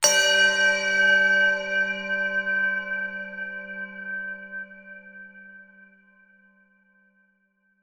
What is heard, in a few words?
Strike Bell (Only Bell); Valley